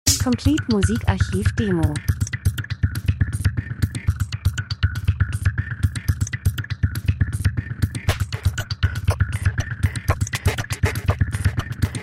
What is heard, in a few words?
drums; Hektischer Elektro Rhythmus, Urban, Chaos, Aktion, Menschen Zeitgeschehen Maschine Dokumentation Industrie